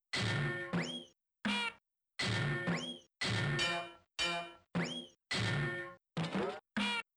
menu geraeusche; jazz jackrabbit 2

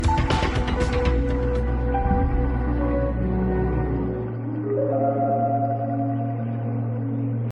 erfolg jingle; We Were Here

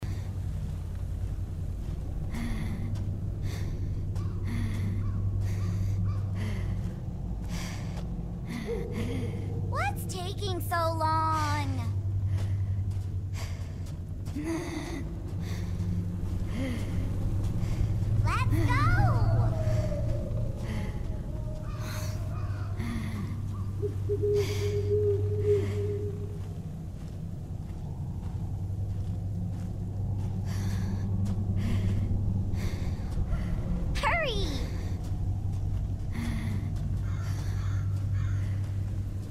wald; Never Ending Nightmares

